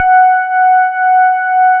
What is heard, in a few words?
DX PIANO; East West; Twisted Textures-BT